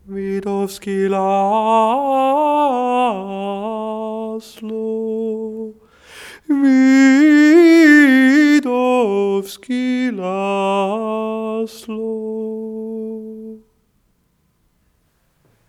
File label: gregorian